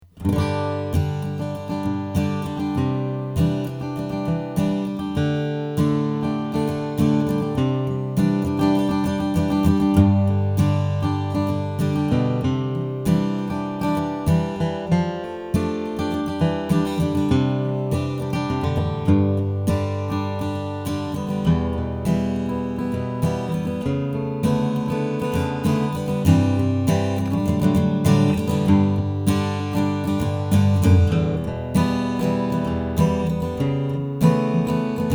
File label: flammang j 35 sunburst